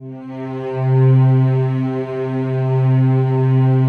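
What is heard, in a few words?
PAD, atmospheric pads; Best Service; XX Large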